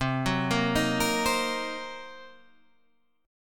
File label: C Minor 7th Flat 5th, Guitar, Standard; arpeggiated; JGuitar